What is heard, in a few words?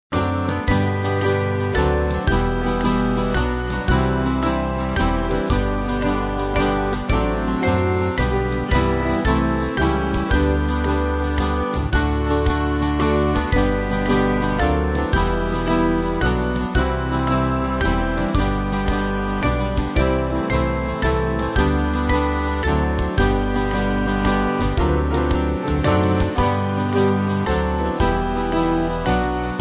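Band, Music Backing; There Is A Fountain; Belmont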